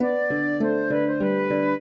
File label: trio, flute harp; Mozart